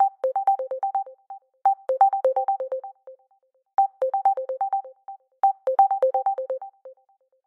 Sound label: incoming call